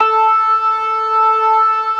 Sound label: ORGAN, C3 PERC; Keith Emerson Signature Series